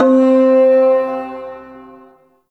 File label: Shawzin Oct One C; Warframe; Duviri Ersatz Shawzin